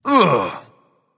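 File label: nonverbal ugh; smite; voicepack; merlin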